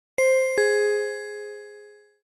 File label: doorbell office chimes; sweet doorbell sound